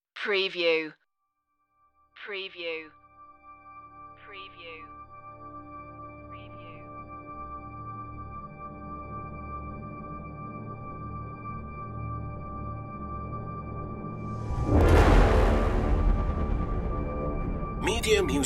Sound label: intro no choir